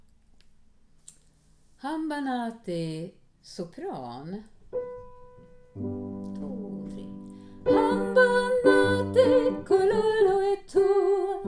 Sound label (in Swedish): sopran; hamba nati